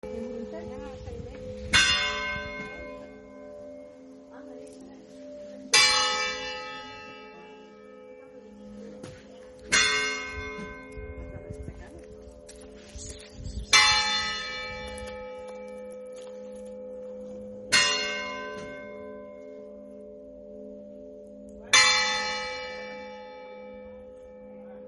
campanas; hinojares

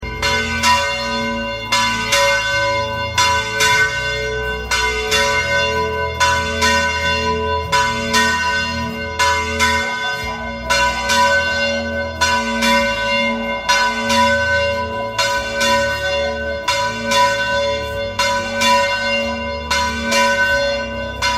Sonido Nueva Campana; de la Ermita de la Virgen de la Cabeza

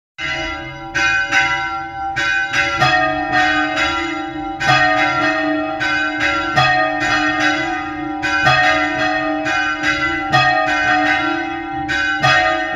campanas iglesia; nuestra sra de la paz chilluevar

